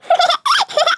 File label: Giggle; Mamabugga